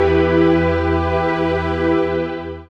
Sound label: SYN DANCE; Sound & Vision - Gigapack II